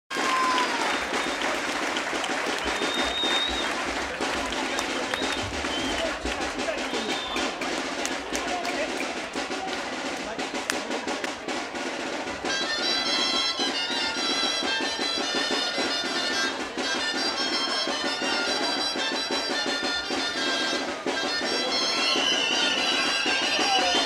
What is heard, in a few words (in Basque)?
Kalejira, Gaiteroak; Uharte Arakil; Uharteko zortzikoa San Joan egunean (herriko jaiak) dantzatzen da eguerdian